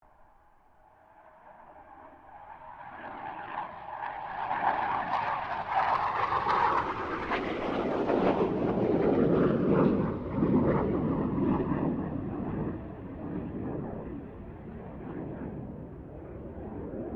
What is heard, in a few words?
1997 BAE HAWK 127 PASS; Airplanes